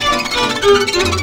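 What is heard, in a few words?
VIOLIN GROOVE; Best Service; World Colours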